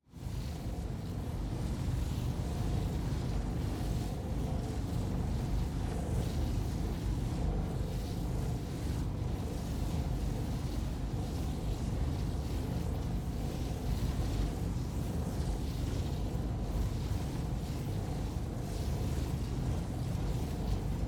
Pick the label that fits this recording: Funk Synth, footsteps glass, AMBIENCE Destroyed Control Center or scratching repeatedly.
AMBIENCE Destroyed Control Center